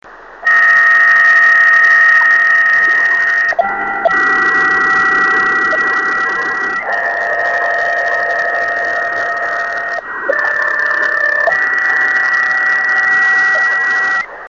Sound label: unknown Data Type 26900KHz; unknown type data transmission (possibly POCSAG) on 26.900 MHz 01-APR-2015